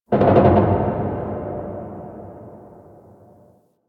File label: Stadium Metal Creaks Medium; Warframe; Ambience; Nineteen Ninety Nine